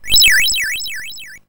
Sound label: Spinner; SFX; YM; CHIPSHOP DELUXE; CHIPSHOP HITS